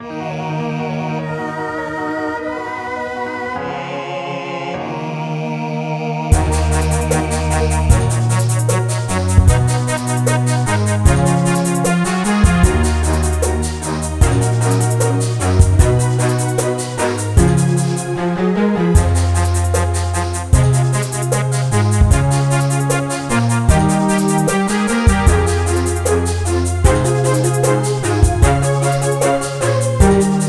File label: white limestone